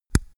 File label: microphone pop off; objekte